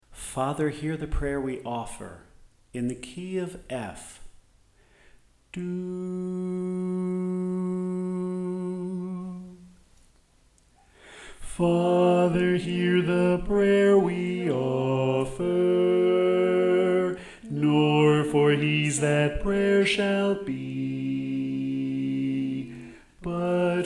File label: Bass